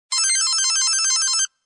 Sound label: Tone; LX160